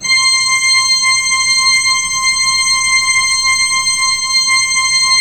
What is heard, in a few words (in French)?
Orgue Eglise Full C7, Pipe Organ Full